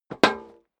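step dress boots metal dry single stair down, footsteps; schritte; menschen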